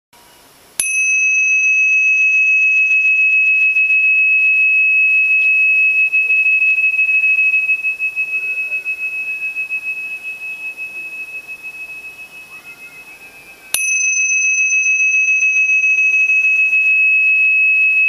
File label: tingsha